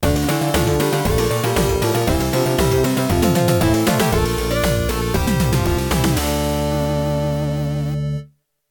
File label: Game Over; Never Giveup; Rusty PC98 OST; MSX Recording